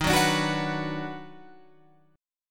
Eb Minor Major 7th Sharp 5th Eb down Guitar Open F, chord; EbmM7#5; strummed; JGuitar